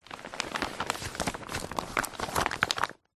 leisure game mat plastic sheet folded movements handling; twister